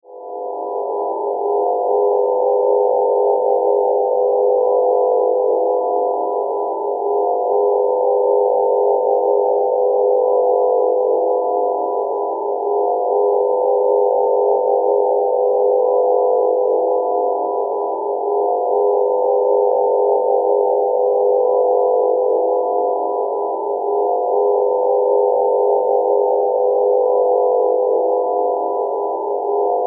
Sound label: Sci Fi Drone Energy Source Light Flutter; Modulation; Blastwave